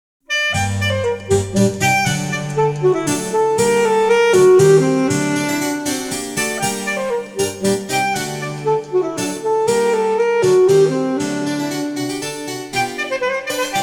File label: Sonata Courante; Eccles